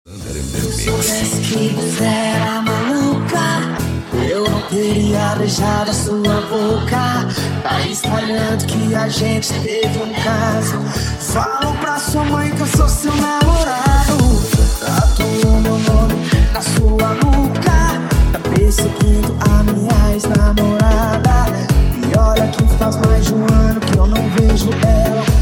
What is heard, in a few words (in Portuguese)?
sertanejo remix